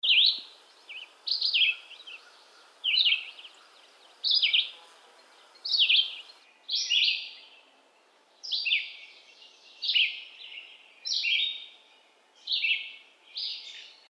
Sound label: VIREO RED EYED